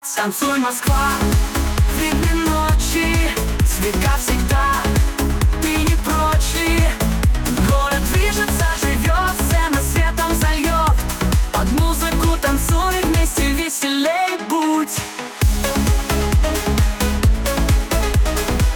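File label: dance Moscow techno project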